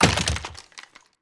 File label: impact gun light